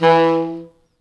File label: E2, Pop Brass; Best Service ProSamples vol.19; AKAI